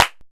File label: Bass Clap, Jomox Airbase99, Drum Machines